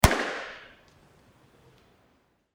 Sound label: wheel burst